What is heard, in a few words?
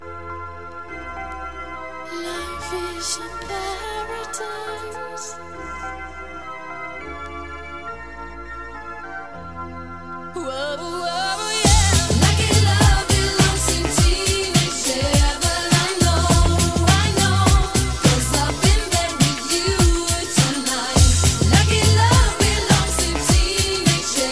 europian remix